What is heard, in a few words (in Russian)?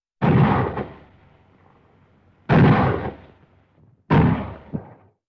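выстрел из миномета 81 калибра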